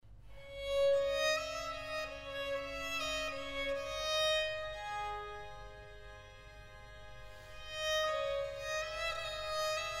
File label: Violin Concerto for violin strings continuo in G minor Movement 2